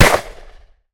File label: unload, weapon; weapon-hitman